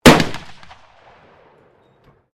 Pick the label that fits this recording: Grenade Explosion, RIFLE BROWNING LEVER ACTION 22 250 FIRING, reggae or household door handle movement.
RIFLE BROWNING LEVER ACTION 22 250 FIRING